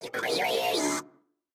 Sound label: construction robot; factorio 2.0.55